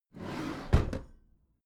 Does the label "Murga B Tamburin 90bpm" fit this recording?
No